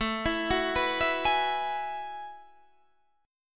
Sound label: F sharp Minor 11th